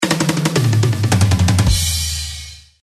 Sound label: Drum Kit Solo; rocktopus